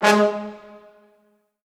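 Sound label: Orchestral Brass, G sharp 2; Best Service ProSamples vol.20; AKAI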